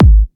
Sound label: dope bass drum one shot G sharp key, kick sample tuned to the G# note